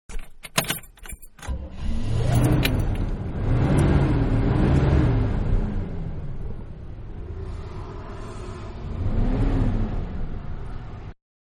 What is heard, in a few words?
Start Up Accelerate Interior, Van; Cars; Vehicles; Van-Start Up And Accelerate-Interior